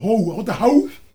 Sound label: HOWA-HOTAH; Heart of Africa; Spectrasonics; Heart of Africa 1